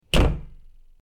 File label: door closed